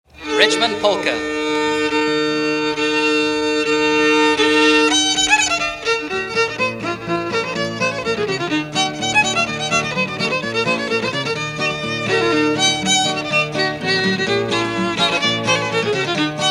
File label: Polka